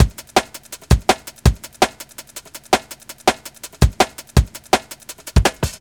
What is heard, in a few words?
165, drum loops, drum & bass